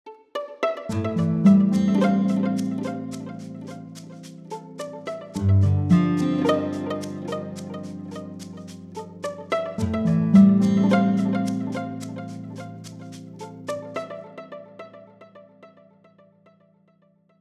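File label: bgm 108bpm A minor; 26beats; chicory a colorful tale; ps4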